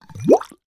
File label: drink big bubble blown into glass through drinking straw; food